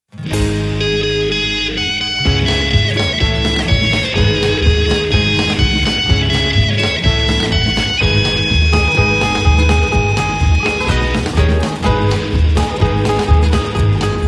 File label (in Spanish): pista, pistas musica latinoamericana